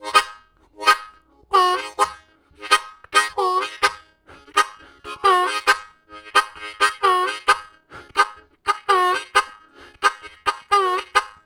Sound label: Gapping, Harmonica Riffs; Zero G